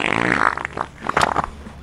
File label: fart wet medium; Farts